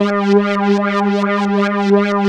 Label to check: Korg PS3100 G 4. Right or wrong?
Right